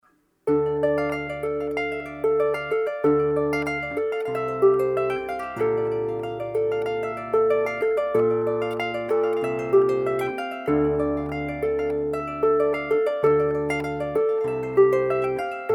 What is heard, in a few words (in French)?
cordes nylon celtic